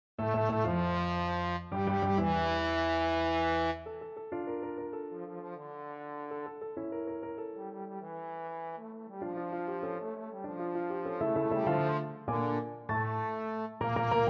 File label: symphony 5 1st mvt, trombone